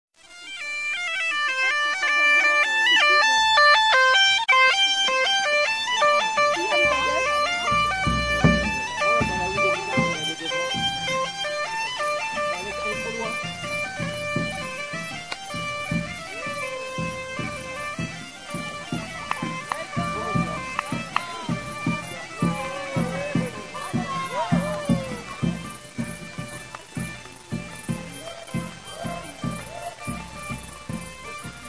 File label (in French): cornemuse